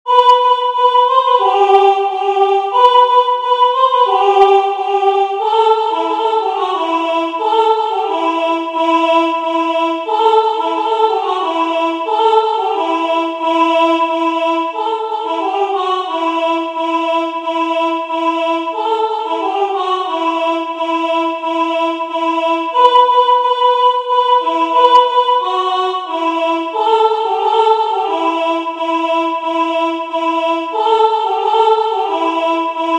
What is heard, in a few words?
song caddo; The Ghost-dance religion and the Sioux outbreak of 1890